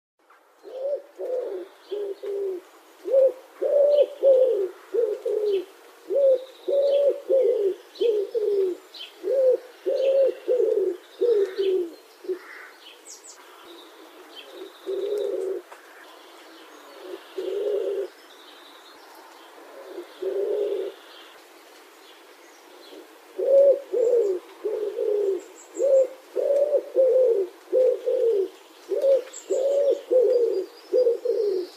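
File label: voice of a wood pigeon; vjahir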